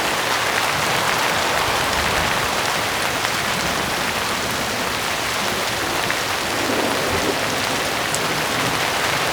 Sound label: Rain Loop